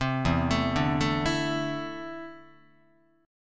E 7th Suspended 4th Sharp 5th E down Guitar 4A 4E 5A 5D 5G sharp 6C sharp; arpeggiated